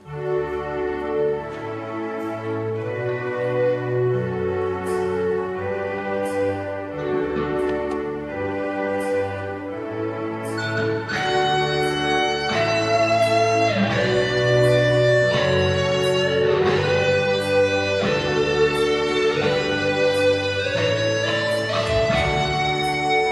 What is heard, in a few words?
Pachelbel rock